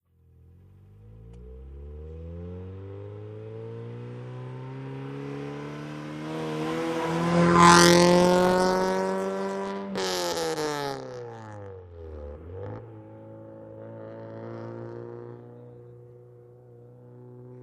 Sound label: Integra, quick approach, accelerate by, shift & decelerate away, Acura Integra